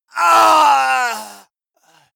male death cry; schreie; menschen; soundpool

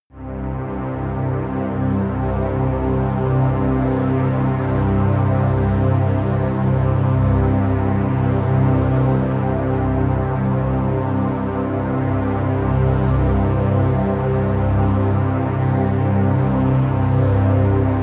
pad sweep gentle; flaechen

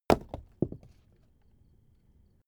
stone small impact; natur-tiere; steine

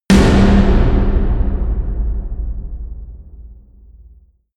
huge tom hits; music elements; percussion

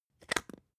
spice jar smooth close creak; industrie wirtschaft; gastronomie

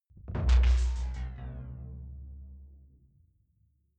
sci-fi alien splash impact dark; swooshes-swishes

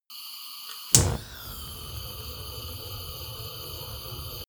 gas stove ignite flame; gastronomie; berufe

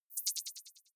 click decay, signale

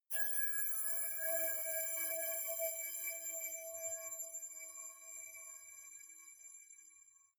metal high brass stick singing; maschinen; soundpool

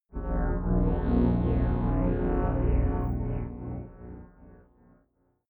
sci-fi galaxy night fall; sweeps swells; comedy-cartoon; soundpool

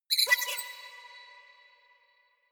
notification hectic, signale; kommunikation

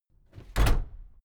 case lock close reverberant; objekte; tueren

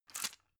drug blister pull out smooth; berufe; arzt

